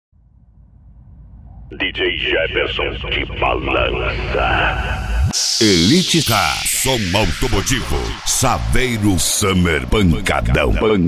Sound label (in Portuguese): som automotivo; elite car